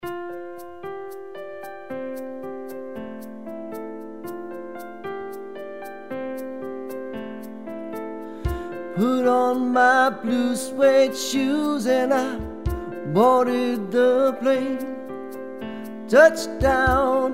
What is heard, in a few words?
Swing